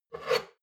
shot glass grab table slide hard, gastronomie; berufe; soundpool

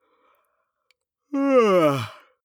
male yawn vocal fake; koerpergeraeusche; menschen; soundpool

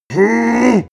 male giant charge; menschen; schreie

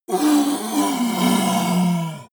yeti groan painful; kreaturen

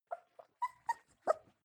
puppys whine short; natur-tiere; hunde

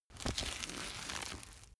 boxing gloves close velcro

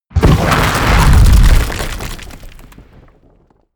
eruption, erdbeben

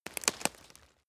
step leaves branch crack; schritte

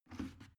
plastic water boiler lift up

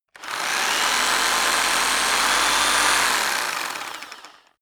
rock drill hammer rev very long; maschinen; bohrer; soundpool

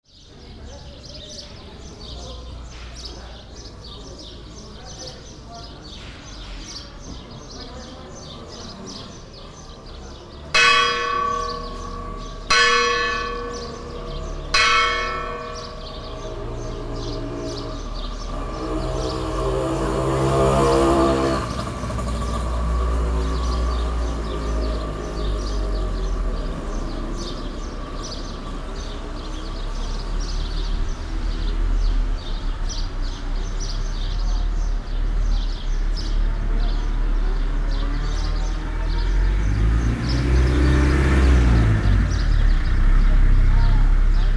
village square bells cars; strassenverkehr

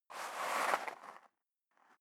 step leather shoes gravel light scuff; schritte

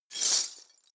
glass; comedy cartoon

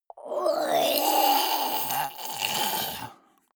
monster die gurgling high; kreaturen

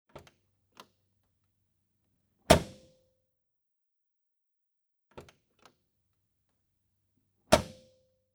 porsche door open and close

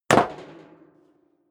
step sneaker metal rattle jump; schritte

